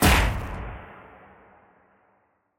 Big Gun; SoundsCrate

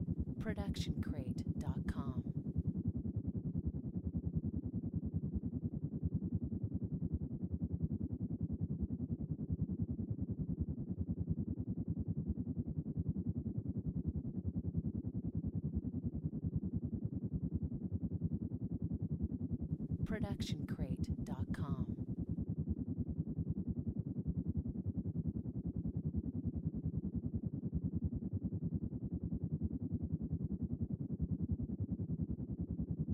distant helicopter; SoundsCrate

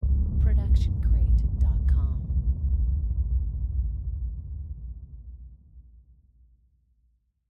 shockwave

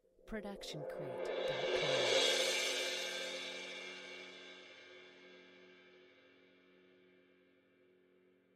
ride cymbal 20 inch swell mallets medium; soundscrate

